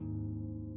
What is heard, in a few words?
harp